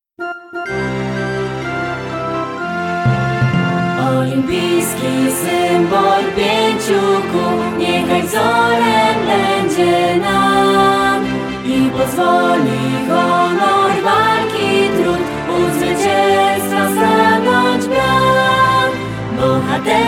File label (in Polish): Hymn szkoly